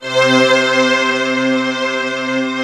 STR, YAMAHA, Analog

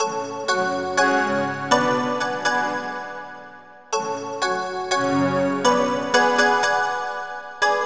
Luna Pad; Sonic Foundry (Sony Creative Software); Club Joints and Street Anthems; Razor Edge

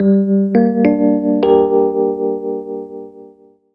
keys, Roots Funk; USB Soundscan vol.41; AKAI

